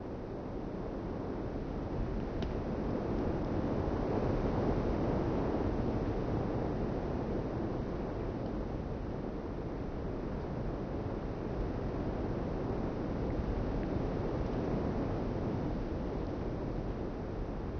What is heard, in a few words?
ruin, lake